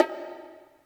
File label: Bongo H, Yamaha PSR E305